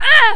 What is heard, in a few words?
pain, female